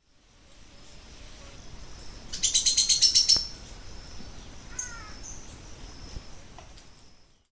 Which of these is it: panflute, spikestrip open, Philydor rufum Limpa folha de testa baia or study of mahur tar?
Philydor rufum Limpa folha de testa baia